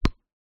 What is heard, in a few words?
sport tennis ball bounce hard court